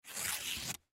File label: Cardboard Rip Tear; Materials; Foley; Sound Effects:Materials; SoundEffects-Blastwave